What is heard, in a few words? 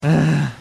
ehhhh, tendies laugh